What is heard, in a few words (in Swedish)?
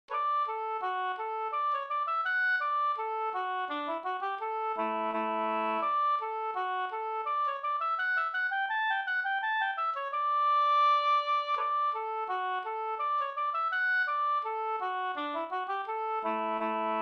ganglat